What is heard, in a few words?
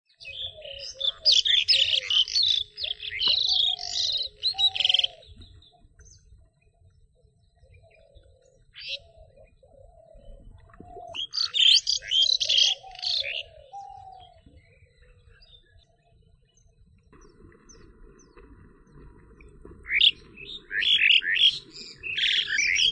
asian pied starling, birds